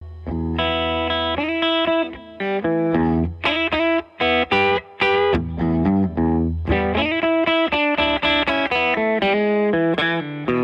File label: Jensen BB40 CLEAN HB MB BLUES; Jensen BB40 Audio Tracks